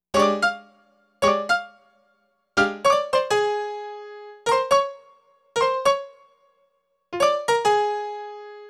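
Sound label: 110 Jazz Piano B flat minor; Grand Piano; Digidesign; Pro Tools Essential Loops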